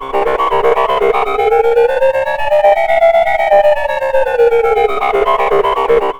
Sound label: ARP SEQ; Big Beat Samples & More; AKAI; ESO SEQS KIT